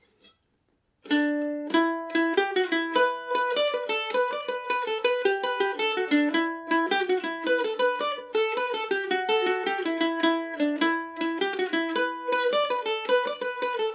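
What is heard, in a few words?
E dorian, Irish mandolin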